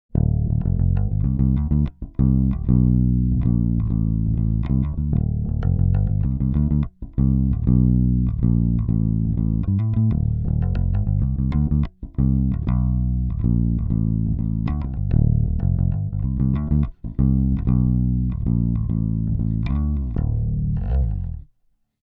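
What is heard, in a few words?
Bassman 300 unprocessed; fender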